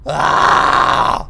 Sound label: zombie man